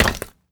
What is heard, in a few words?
concrete; casualtiesunknown